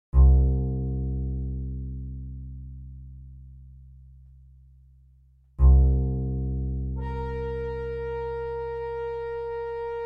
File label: C Blues Drone